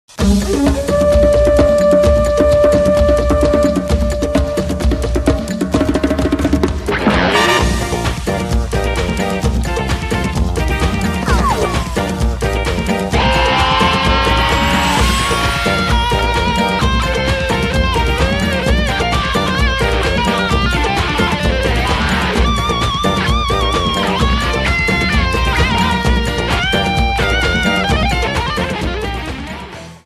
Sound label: Instrumental; DKCTV